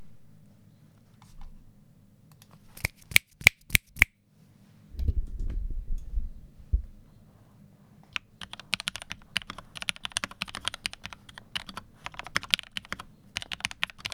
Typing Test Lepold FC 980 M Mod Mx Black Film Lube; by Puree